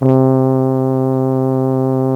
Tuba; Software Explosion 2 - Virtual Computer Pets; yamaha xg; gm tones

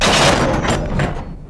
land; roadwars

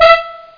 licks; temptris

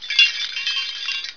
chimes; Digispeech Portable Sound Drivers

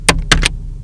KEYBD

